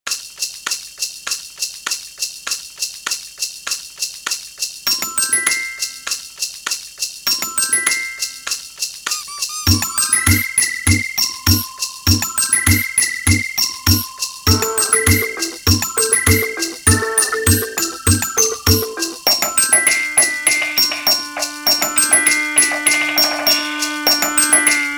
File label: happy bells; weeklybeats-2016